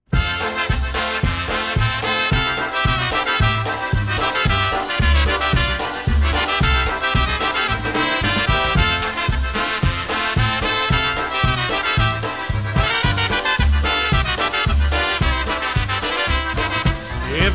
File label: Polka